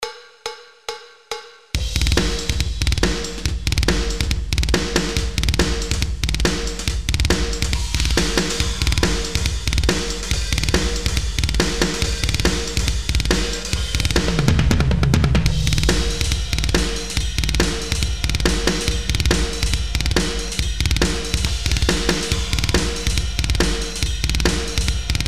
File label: taldrum icebergdrums old tape